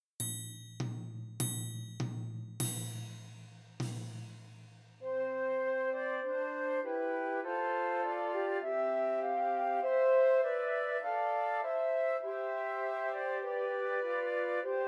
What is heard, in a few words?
Trios for Three Descant Recorders